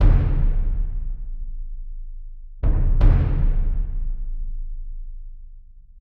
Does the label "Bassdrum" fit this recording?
Yes